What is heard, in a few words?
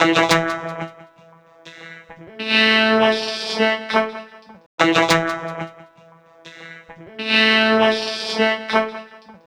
Vocoder; Trip-Hop; Loops; Sonic Foundry (Sony Creative Software); Rondo Brothers Trip-Hop Loops & Samples; El Corazon